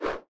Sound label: yeti hurt